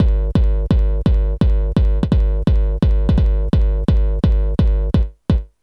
kick; Techno Maker SPCS

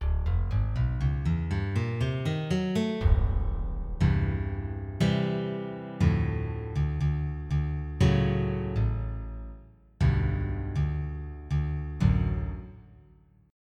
acoustic guitar

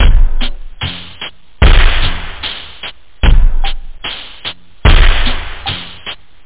modular, loop, percussi; da capo vol1

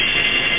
monster beam; Battalion

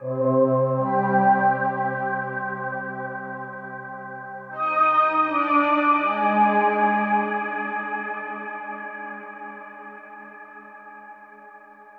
SYNTHPAD AMBNT 160 C; eJay; Ambient; Sphere

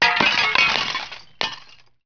glass break; KATANA; Daikatana

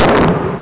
explosion; Metal Knights

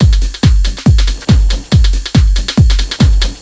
movie, Techno Drums; TechnoMusicMaker

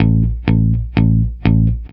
Muted quart notes, Rock Bass; ACID MUSIC

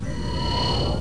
shld up

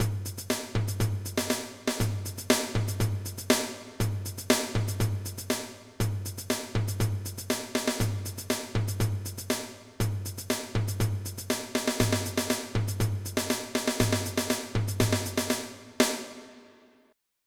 DRUM PAT, MIDI

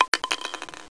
shot shl, bullet shell; Sin demo; impact

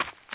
step, humans; sfx; starship trooper

